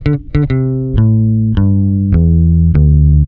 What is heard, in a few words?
bass, Roots Funk; USB Soundscan vol.41; AKAI